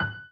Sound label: F#7 Gb7, Piano